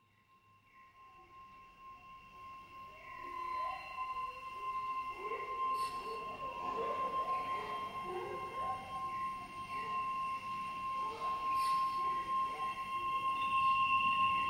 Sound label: Synthetic Swamp flutes, wooden folk